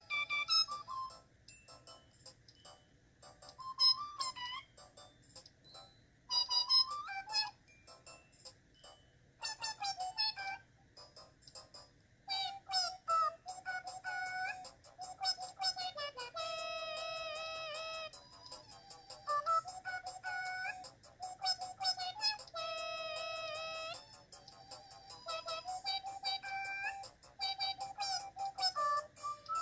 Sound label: singing